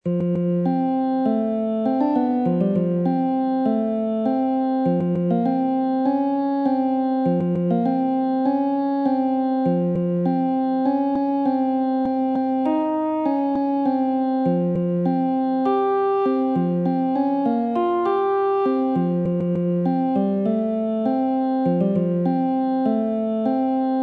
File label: jazz